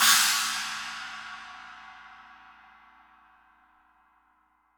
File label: DW Green Maple China Cymbal; Sonic Foundry (Sony Creative Software); Vital Drums